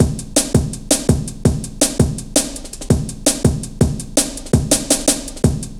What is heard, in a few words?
Ruffneck, Drum Loops 165.5; Zero-G Jungle Warfare 1, 2 & 3